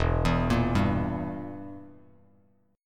E Diminished down Bass Standard, chord; Edim arpeggiated; JGuitar

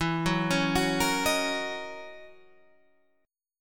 F sharp 13th E

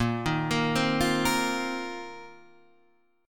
A sharp Suspended 2nd Suspended 4th down

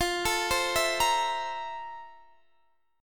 F Major 11th Guitar Half Step Down

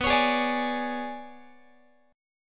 B Minor 6th Add 9th B, Guitar; Bm6add9 strummed

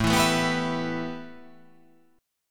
A 7th Suspended 2nd; x,12,14,14,11,14,13,13

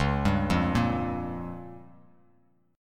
Db 6th Flat 5th Bass Standard, Db6b5 chord; Db6b5 arpeggiated; JGuitar

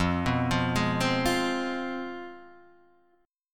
C Major 7th Suspended 4th Sharp 5th, guitar

